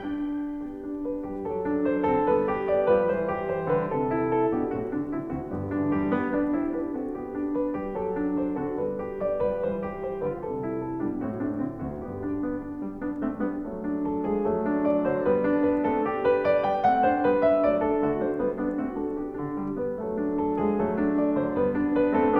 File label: Variation, Cello; 10 Variations on Ich bin der Schneider Kakadu in G Major, Op. 121a Variation I